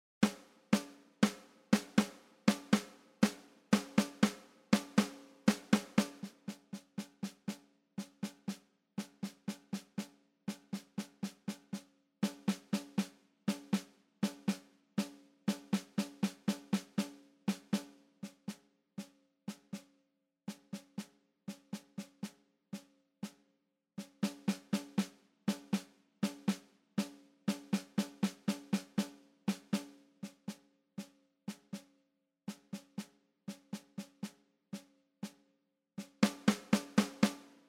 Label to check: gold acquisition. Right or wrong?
Wrong